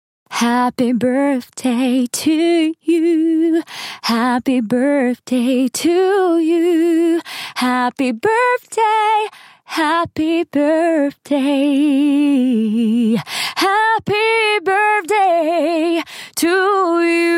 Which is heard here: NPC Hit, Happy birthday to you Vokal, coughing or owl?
Happy birthday to you Vokal